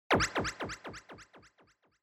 Short Accent Delay

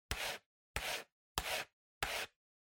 PC Mouse Move Hard Pad Hit

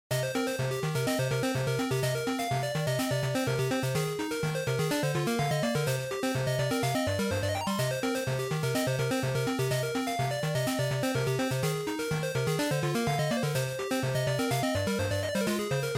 Action Stage3

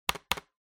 Trackpad Click L Hard Double

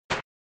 SNES Golf Ball Tree

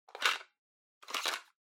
Portable Cassette Open Eject

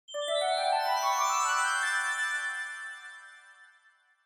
Scene Change High Up Slow

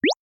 Motion Pop

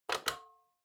Flip Clock Button On

